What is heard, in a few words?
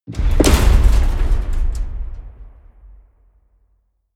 metal crash large